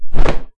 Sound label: flag; mine sweeper epoch times